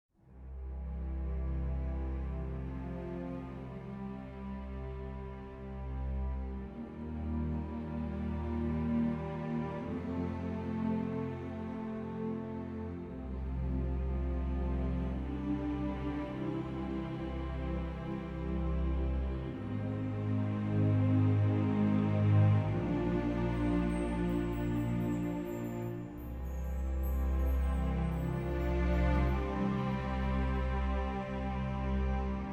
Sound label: World Music